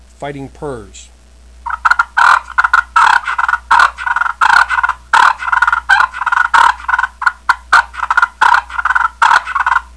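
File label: dueling fighting purrs, turkey call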